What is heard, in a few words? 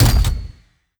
combat step, strider, steps; creatures; Half Life Model Pack